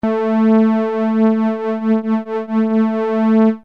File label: lfo2 amp; nord